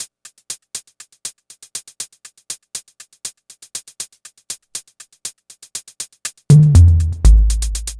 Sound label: Hi-Hat Esoterica, Beats